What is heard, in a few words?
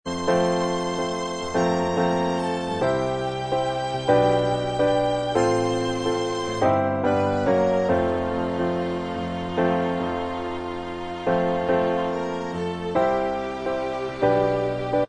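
instrumental track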